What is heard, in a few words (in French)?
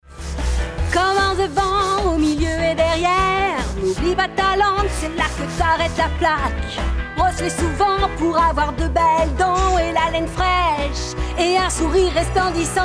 Rockroll dentaire, voix chantees rock